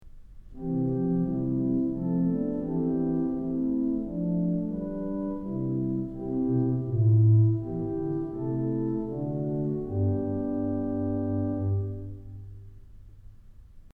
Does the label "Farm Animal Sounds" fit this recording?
No